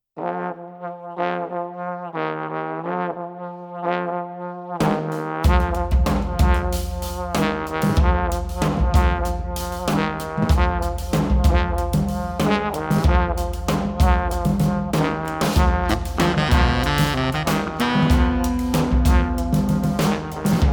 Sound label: BRASS BAND